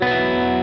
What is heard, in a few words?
Arpeggio down